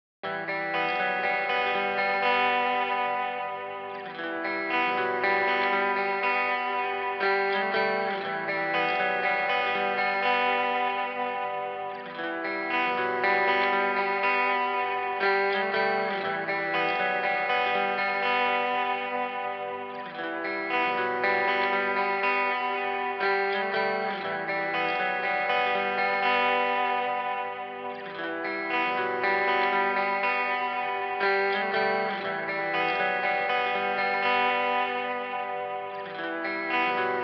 120 BPM E Min Guitar Harmonies; Cymatics; Miami Guitar Pack - Pt 2; Missed Calls

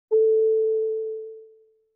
Soft Chime, Vintage Cartoon

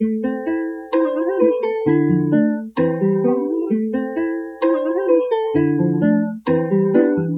Various Melody Loop - 130 BPM A Min Guitar; Loop Stems; Cymatics; 2020 Melody Collection

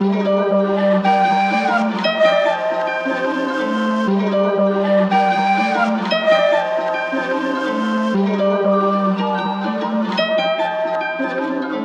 118 BPM G Min, Melody, Trap; Cymatics; 2022 Melody Collection; Trap Pt 2

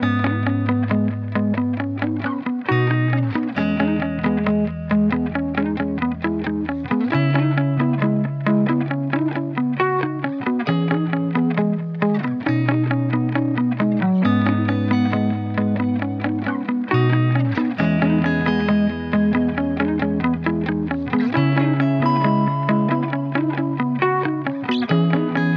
135 BPM G# Min, Guitar; Reasons; Cymatics

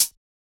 Closed Hihat; Drums; One Shots; Cymbals; Humble Closed Hihat; Cymatics - Humble Hip Hop Sample Pack

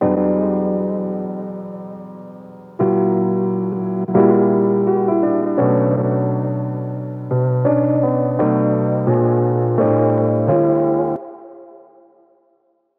Piano Wet 86 BPM B Min; Melodics; Cymatics - Eternity Sample Pack

